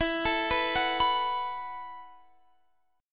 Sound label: E Suspended 2nd Suspended 4th E, down, Guitar, Standard; arpeggiated; JGuitar